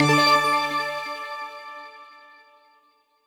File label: Quest Complete; Super Mombo Quest